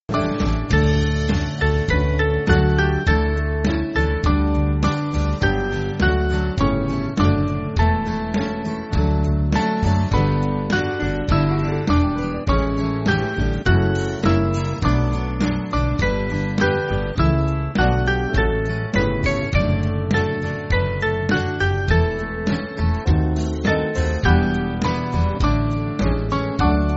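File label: Band, Music Backing; Dear Lord And Father; Repton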